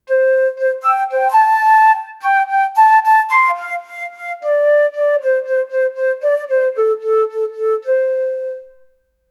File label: 4 notes of pretty popular, flute